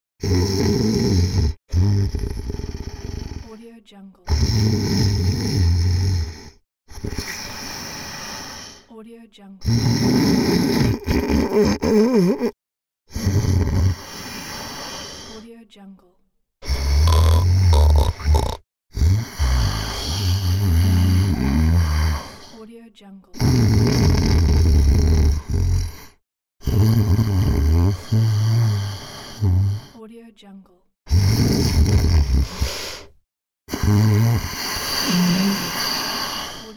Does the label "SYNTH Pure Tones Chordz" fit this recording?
No